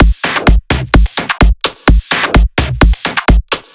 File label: drum loop 128 Full; Anthemic